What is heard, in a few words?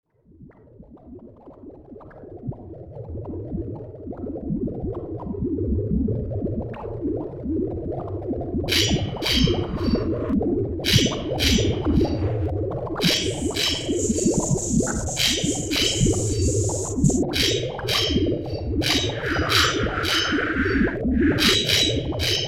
noise dub